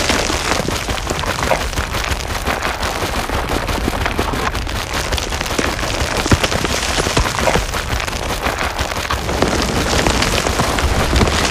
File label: Grass Grinder Close; Titanfall 2; Boomtown